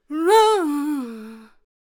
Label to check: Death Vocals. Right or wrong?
Right